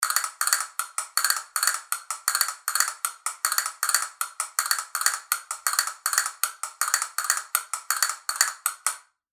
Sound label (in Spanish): castañuelas tela